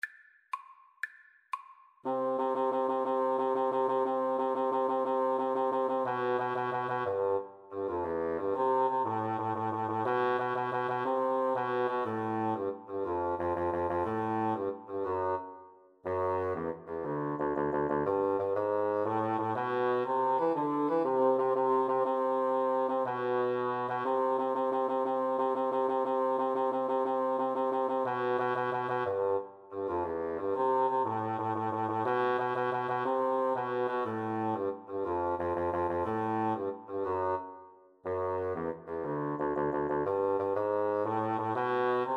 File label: 2 bassoon; duet; mendelssohn italian